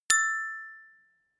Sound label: notification glockenspiel fourth